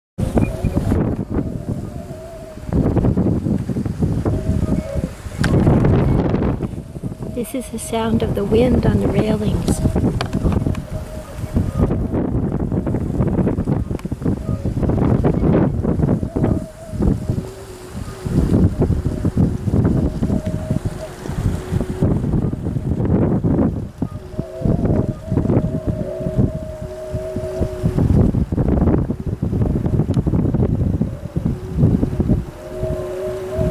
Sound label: wind on railings